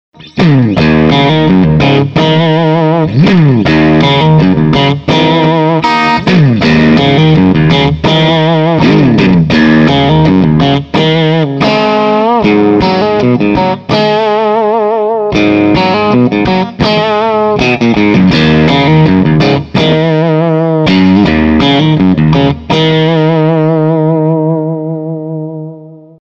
54 strat middle breakup, pickups

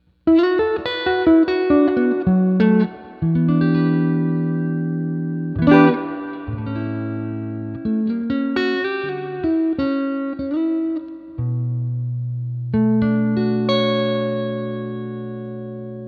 Epic 1955 Neck, Pickups; Klein

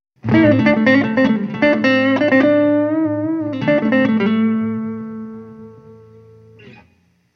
Dallas Blues Neck, pickups

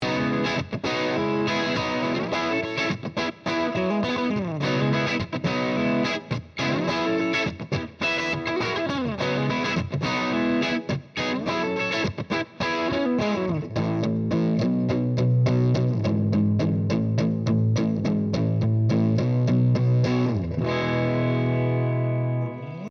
bridge light breakup

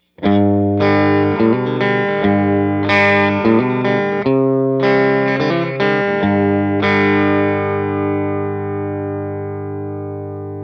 Broadcaster Bridge Rhythm, pickups

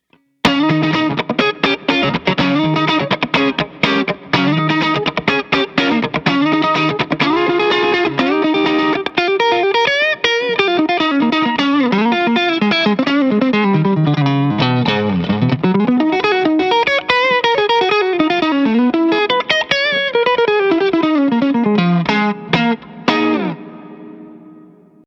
57 Neck modal soup, pickups

